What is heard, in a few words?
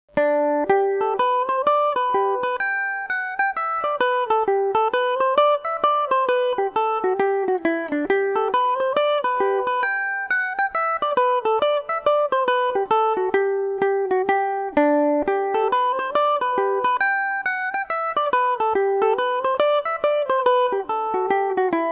hennessys hornpipe gmaj, mandolin; Revelation Jaguar electric mandolin